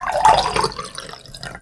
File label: element water; maoudamashii